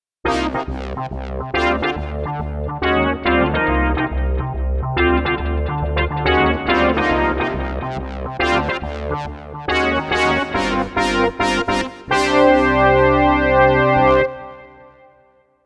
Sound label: FM Brass